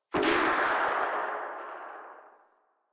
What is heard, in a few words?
Vomit Gun Tail Ext, Weapons, Infested; Warframe